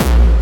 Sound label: kicks